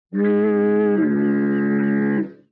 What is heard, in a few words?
cartoon foghorn sound